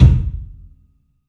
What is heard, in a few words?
KICK, 3-D Percussives; E-MU Producer Series Vol. 5 – 3-D Audio Collection; P.A. Kit